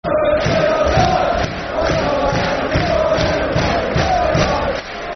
Charlton Till I Die [Football Chant (Soccer Song)]; Charlton Athletic Cafc